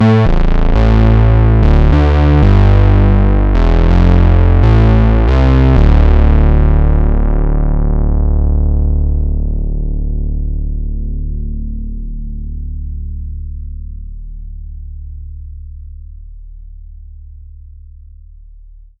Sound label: Moog Messenger; 合成器